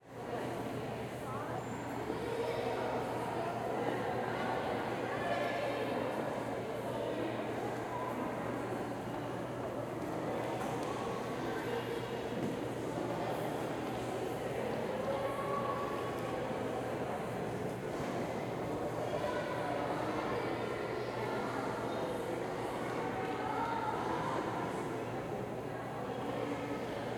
Crowd Interior Hall Museum Footsteps, Children, Reverberant; Surround; 5.1; 2496SoundEffects